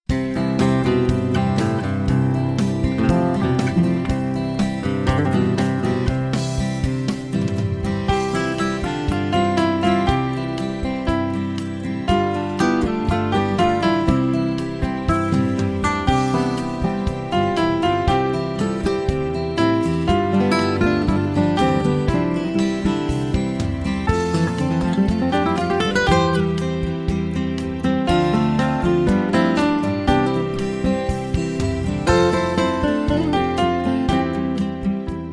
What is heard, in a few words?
C, Trompete